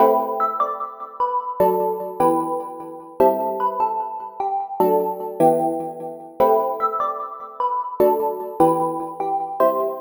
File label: Melody E 150, Melodic Loops, Color Bass; Wonderworld; Ghosthack - Color Bass Essentials